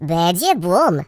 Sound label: Laugh; hedgewars; voices; Russian pl